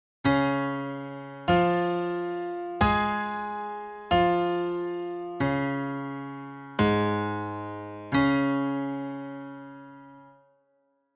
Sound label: Db Major with click